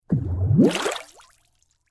Water Bubble Surface Gurgle Large; Nature; Blastwave

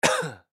Human Cough; Cough Exhale Single Element Male 25 Yrs; Sick; Blastwave; Humans

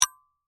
Chime Bell Muted, Music, Chime, Bell, Muted; Bells (Sound Effects:Musical); SoundEffects-BlastWave

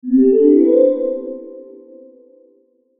Logo Soft Synth Ascend; Sonic Logo; Sonic Logo Sound Effects; Production Element Imaging Element Accent Transition

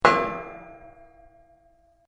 METAL ON METAL; Metal (Sound Effects:Materials)

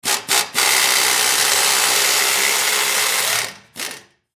Air Gun Drill Rev Tighten Long Pneumatic; Air Gun Drill Rev Multiple Tighten Long Pneumatic; Drills; Blastwave

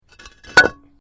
Brick Medium Hollow Fall

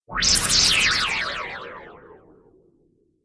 Laser Swish Delay, whoosh sound effect

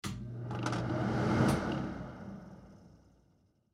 Household Bathroom Fan On Off Fast; Blastwave

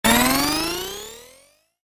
Generic Bonus Or Pickup, video game; Video Game Sound Effects; Video Games (Sound Effects:Games); Stuart Duffield

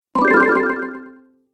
App Delay, ping; multimedia

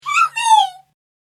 Fairy In A Bottle, cartoon sound effect; fantasy sound effect

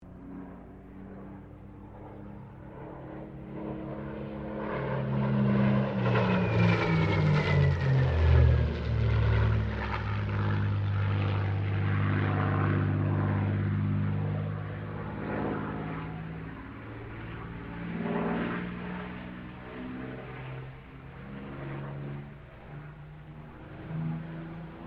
AIRCRAFT SUPERMARINE SPITFIRE MK-XVI LOOP; Airplane Sound Effects; Vehicles

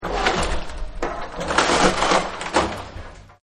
Wood Splinter, Wood Splinter Metal Clang; Materials; Partners In Rhyme

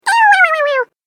Cartoon Game Voice "Ow wowowow"; Cartoon Voice Sound Effects

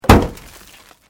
Metal Light Movement Minor; Materials

